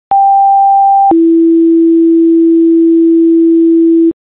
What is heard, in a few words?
page, tones